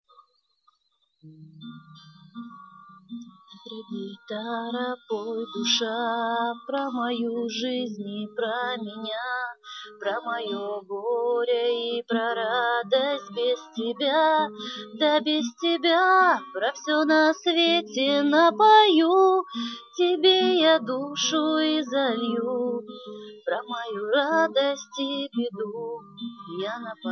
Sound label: guitar